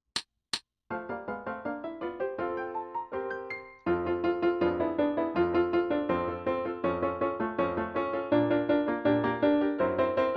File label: Scherzo Full Tempo with solo, F Horn; Shelukov